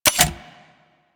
Heavy Grenade Launcher Mech, Weapons; Warframe; Archwing

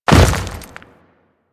Step Dirt, Footsteps; Warframe; Grineer

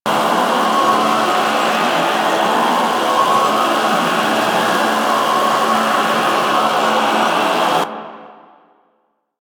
Bellow Idle Loop; Warframe; Entrati

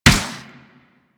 Space Ship Turret Fire; Pursuit Space Ship

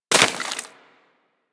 Bullet Impact Debris Snow

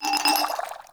Vial Foley G; Warframe; Lasrian; Lasrian Soldiers; Footsteps; Lasrian Goonadier

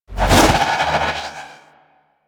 Trooper Melee Spin; Warframe; DE; Sentients

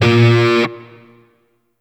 Shawzin Oct One A Sharp; DE; Warframe; Grineer Shawzin

